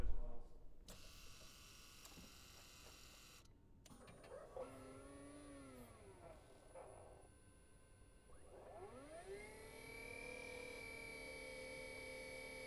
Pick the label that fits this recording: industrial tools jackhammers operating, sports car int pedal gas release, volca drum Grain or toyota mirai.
toyota mirai